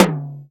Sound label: Toms